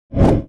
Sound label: axe; Weapon